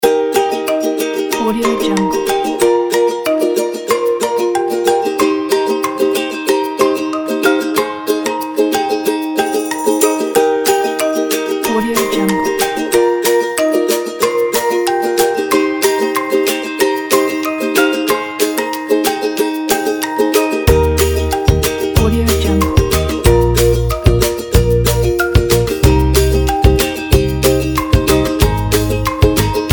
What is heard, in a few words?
happy kit